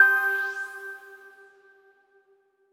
C min collision

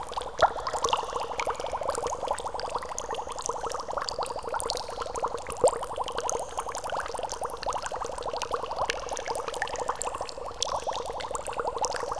cave springs

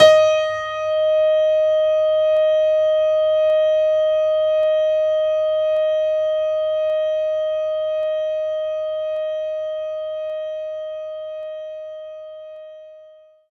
Grand Piano D#5; knights try

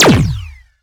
Shot Small Laser Recon Pistol

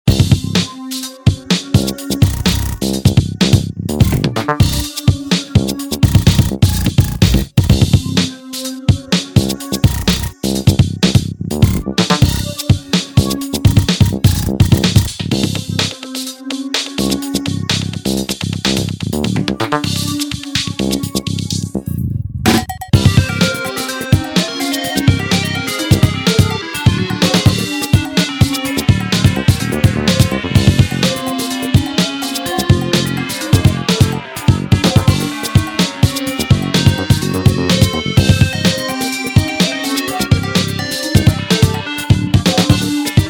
World 1 Music; Cubicity

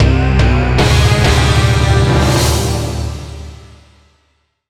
Ui Map Levelup; Space Tyrant

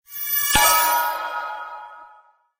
quest complete

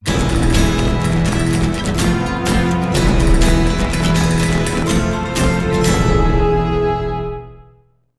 Endlevel Win; El Tigre: The Adventures of Manny Rivera; PS2

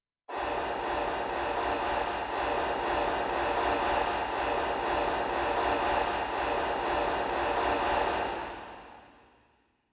Jump; Pro Yakyuu Famista Evolution

